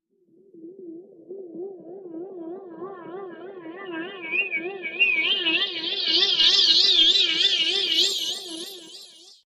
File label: Approaching Waver Tone Transition